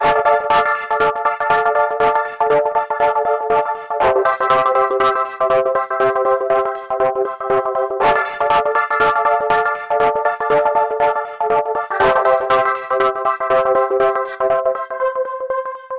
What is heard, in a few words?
alligator pad on